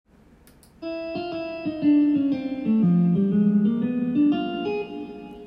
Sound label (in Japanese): jazz fure zu